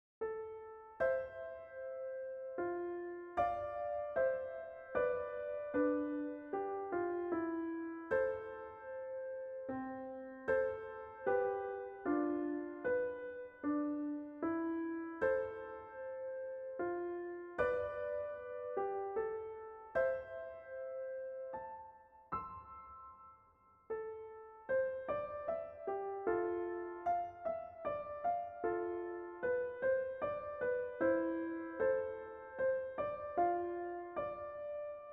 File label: Kalimba